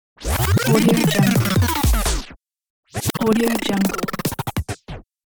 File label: rewind